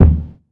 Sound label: fat kick d sharp key; kick drum